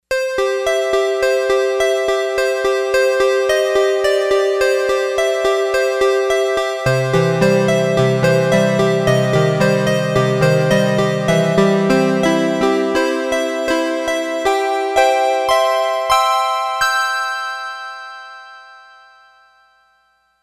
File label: Sy. Decay, Yamaha DX11; Factory Preset Voices